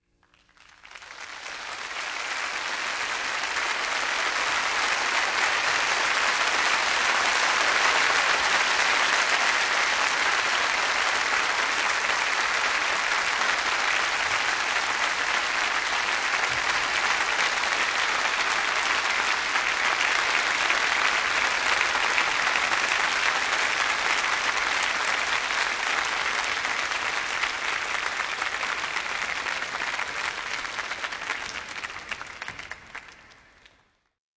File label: Crowds; 헐리웃특수효과음; 군중-혼잡